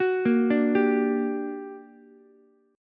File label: G Minor Major 7th G, Ukulele; arpeggiated; JGuitar